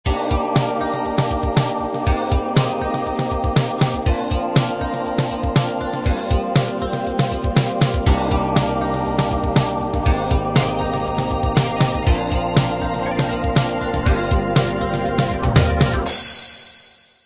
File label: fatback groove intro